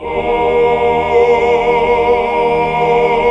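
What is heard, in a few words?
chord 2 7 R; Best Service Gigapack 1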